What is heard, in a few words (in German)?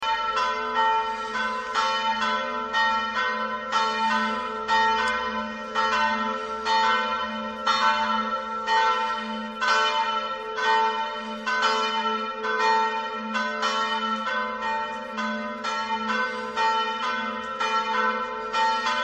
Glocken